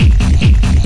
140 bpm, banging club doors, drum loops and breaks